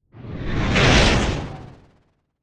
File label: epic swishes